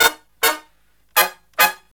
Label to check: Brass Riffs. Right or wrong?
Right